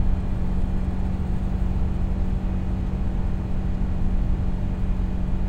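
Subaru Impreza GD WRX - Interior - 6k rpm loop stereo; Soundholder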